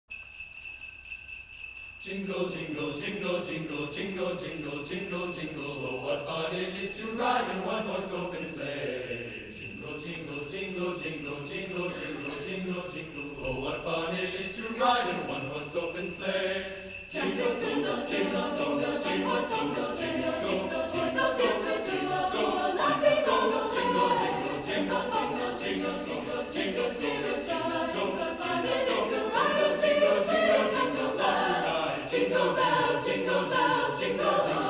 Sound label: Jingle Bells